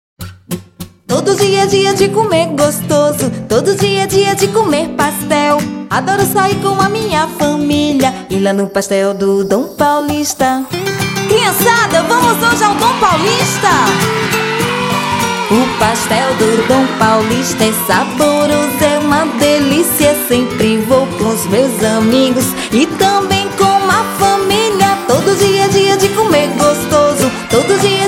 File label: jingle; pastel dom paulista